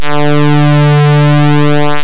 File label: Truck